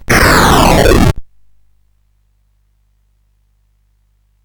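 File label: Moon Patrol, MOS 8580 SID chip music, games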